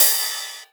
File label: Rides, Cymbals